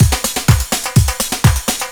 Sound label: tequila slammer, Deep House 125bpm; Zero-G Deep House